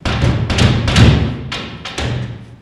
Jail Door Hit X3; jail & prison doors

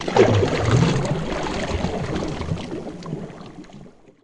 Canoe Paddle Stroke Underwater

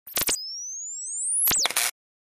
Detonator, timers

